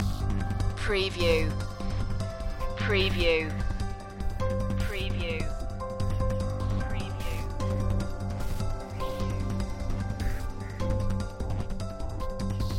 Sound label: breaking news loop